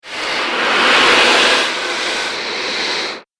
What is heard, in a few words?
Magic Spell Abrasive Motion